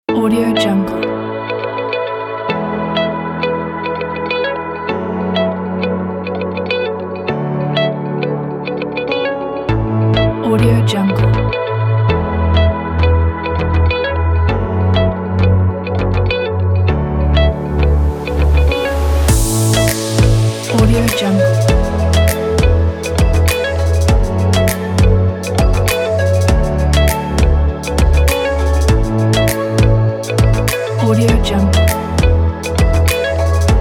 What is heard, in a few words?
pop; ocean-sounds